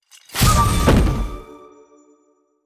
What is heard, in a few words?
chest Win; netent